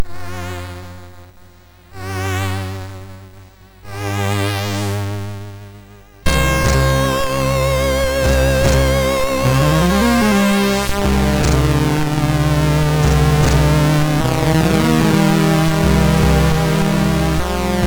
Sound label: Loader, MOS6581R2; Ivanhoe